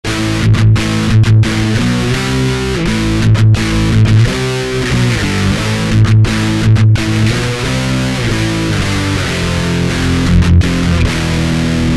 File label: ft lead no speaker sim